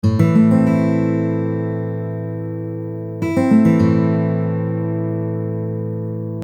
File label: arpeggio